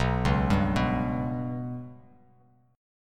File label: B Suspended 2nd Sharp 5th B down, Bass Standard; Bsus2#5 arpeggiated; JGuitar